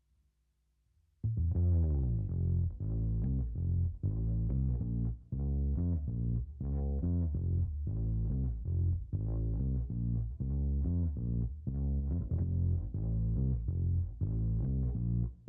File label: Bass Clean